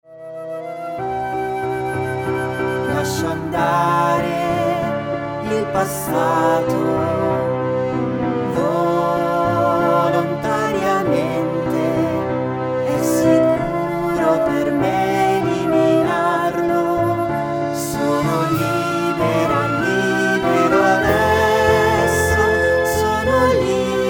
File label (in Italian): Musica